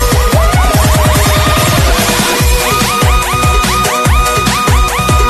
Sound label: breakbeat